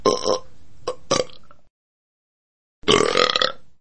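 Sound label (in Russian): отрыжка рвотный позыв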